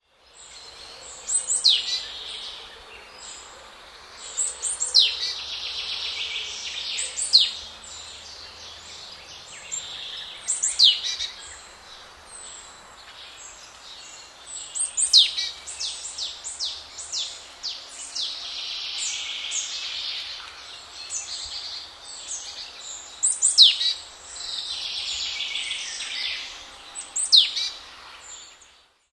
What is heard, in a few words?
marsh tit